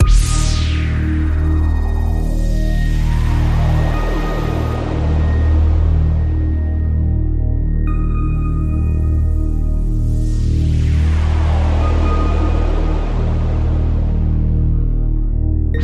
Radio Toolkit Pad

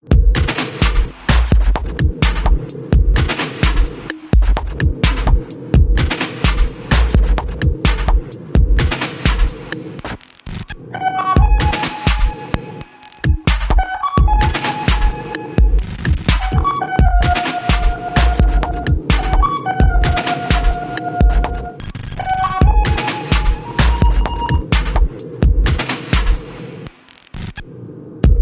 Harps Beat

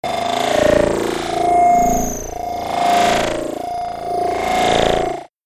Alien Electronics

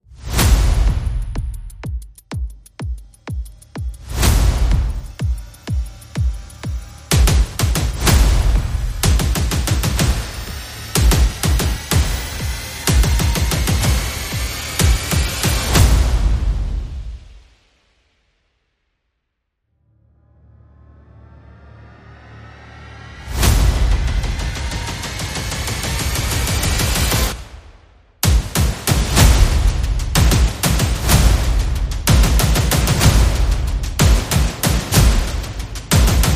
Percussion and Effects

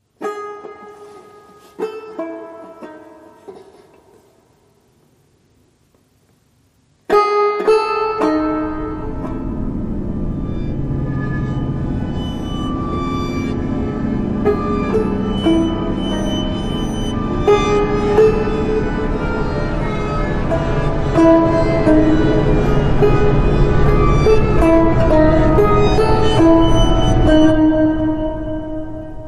Suspense

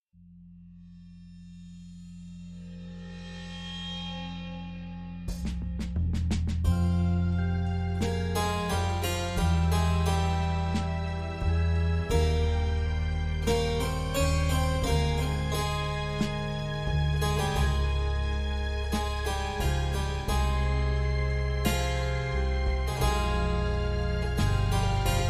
Dark Convent, No Choir

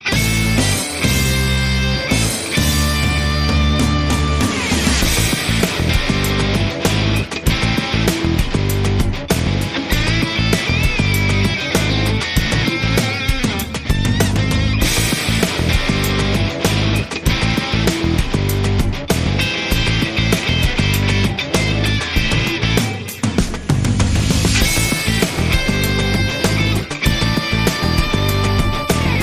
Globe Watch Promo

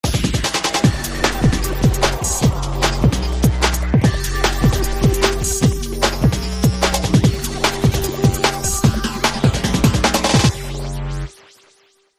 Dnb

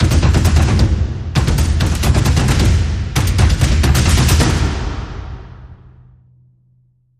Drum Outro

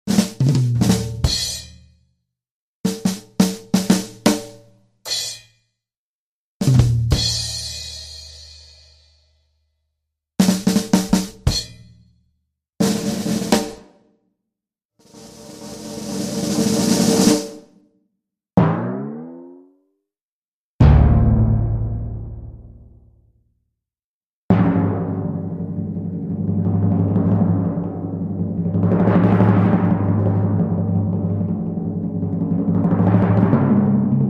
Drums & Percussion Various All On One Track

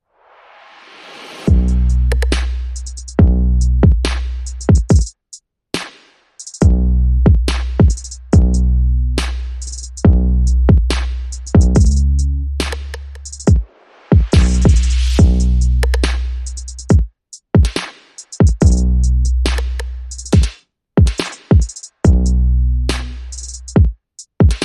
Drums and Bass Only

